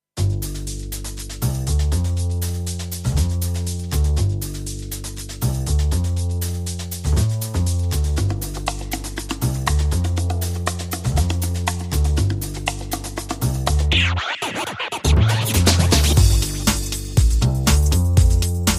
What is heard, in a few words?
Scratch Hammer Rhythm